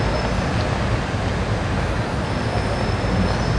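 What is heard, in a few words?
city ambience; CD Action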